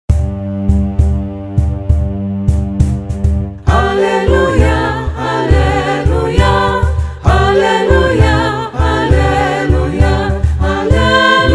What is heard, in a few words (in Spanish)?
Aleluya Sudafrica a 4 voces